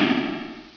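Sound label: disappear; ff6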